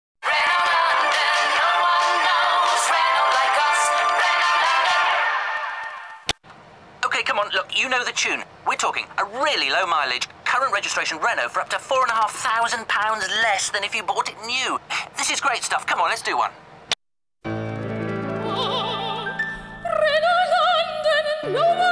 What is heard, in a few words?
Operatic; Renault london